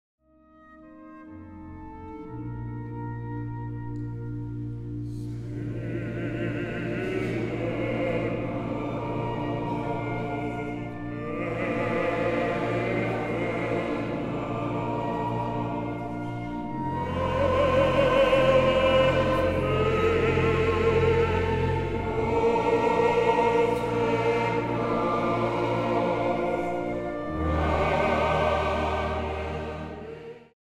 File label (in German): stille nacht heil ge nacht; Kerst met Joh de Heer Nijkerk